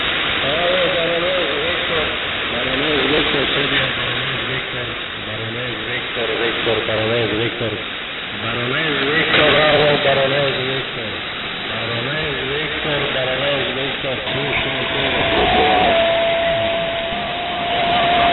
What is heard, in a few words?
1702 kHz; Viktor